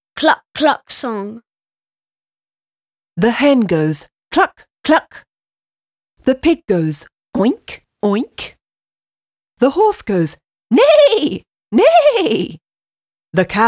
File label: Cluck cluck song